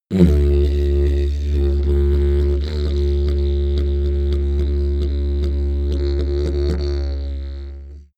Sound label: didgeridoo jump medium